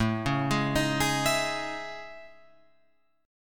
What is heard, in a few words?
A Major Sharp 11th, Guitar Open F; arpeggiated